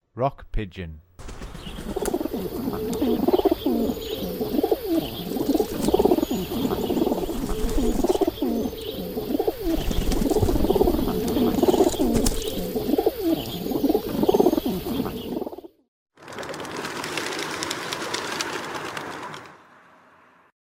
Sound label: Rock Pigeon, Songs of Birds of North America; Western USA; Western USA Songbirds